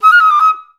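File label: flutes D#5; Best Service; Peter Siedlaczek's Advanced Orchestra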